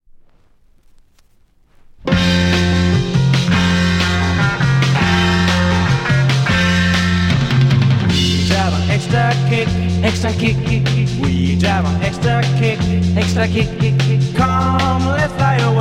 extra kick

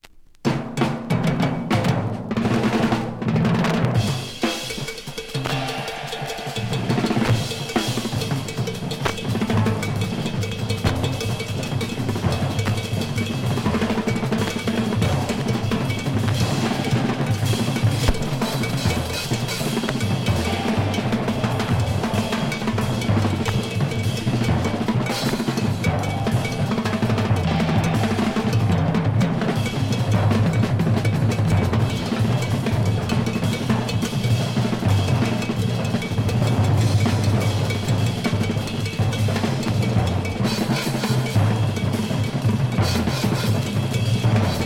some hip drum

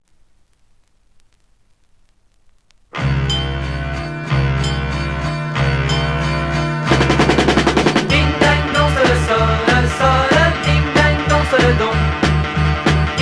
ding ding dong

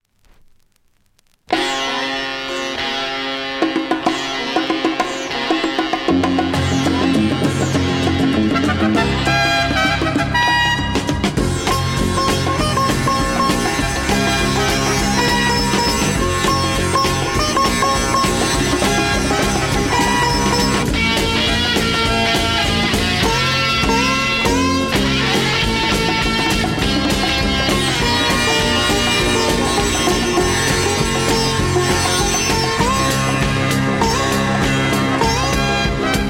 sitar

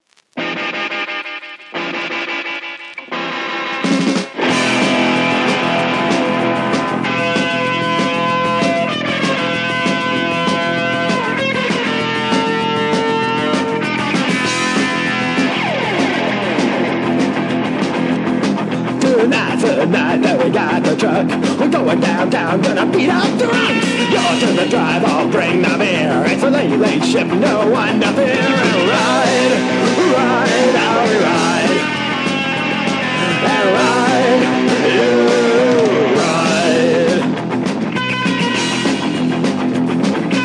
police truck

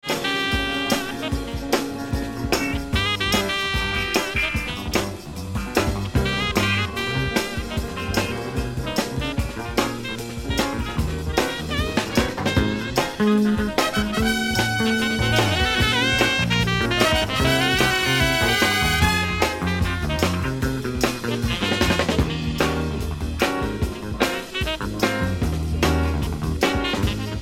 pop jazz international lp

